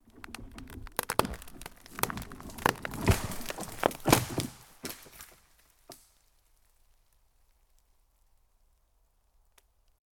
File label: Pine Tree Falling